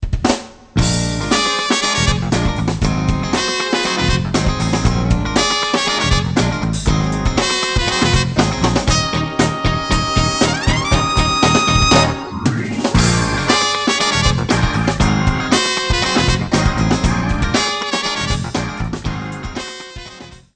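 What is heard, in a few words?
trumpet rnb; Musical Instruments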